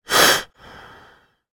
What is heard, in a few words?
human male breath single